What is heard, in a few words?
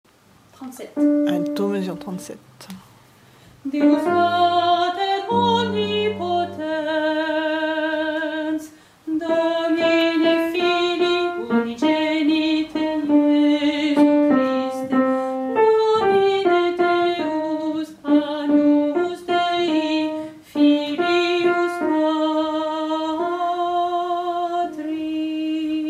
alto; gloria